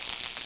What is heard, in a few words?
ELEC